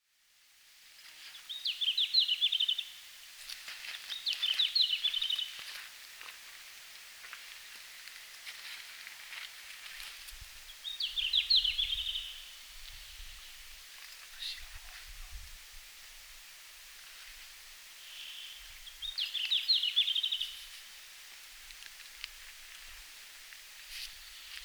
Robin à flancs roux, Finlande

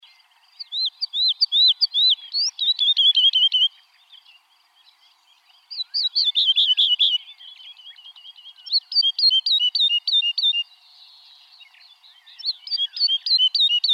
Alouette lulu

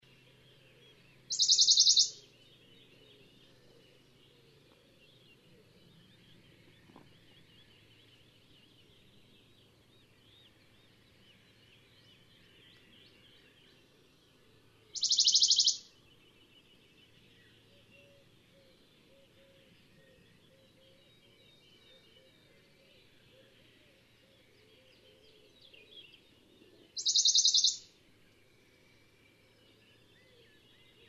Pouillot de Bonelli

